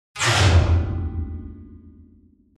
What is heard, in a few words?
Scratch on piano strings